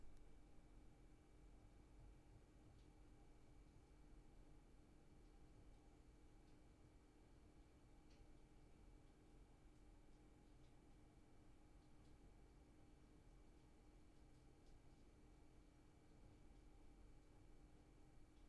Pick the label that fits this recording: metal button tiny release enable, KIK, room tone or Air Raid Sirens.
room tone